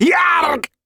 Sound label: Dwarf Rifleman Death